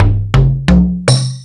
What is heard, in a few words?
Percussion, Drum Machines